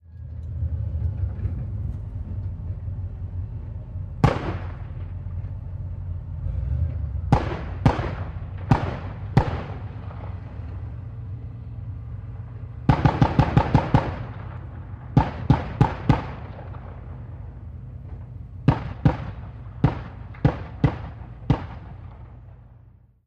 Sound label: Apc: Exterior Driving And Firing. 50 Caliber Machine Gun. Stereo; armored personnel carrier